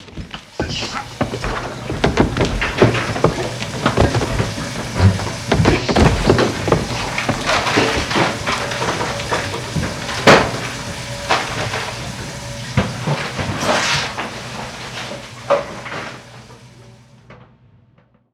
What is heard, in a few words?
interior scuffle; favela; Call of Duty Modern Warfare